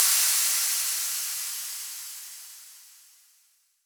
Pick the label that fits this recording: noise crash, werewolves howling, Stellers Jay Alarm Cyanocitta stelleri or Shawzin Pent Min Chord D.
noise crash